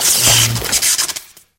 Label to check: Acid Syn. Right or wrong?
Wrong